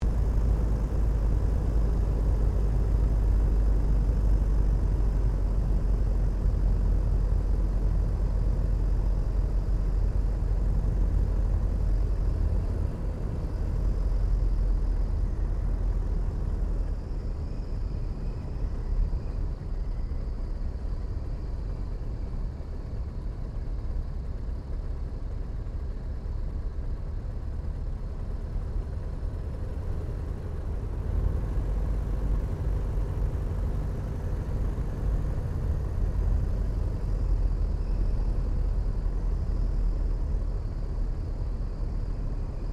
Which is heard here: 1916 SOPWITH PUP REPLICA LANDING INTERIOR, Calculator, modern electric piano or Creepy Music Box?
1916 SOPWITH PUP REPLICA LANDING INTERIOR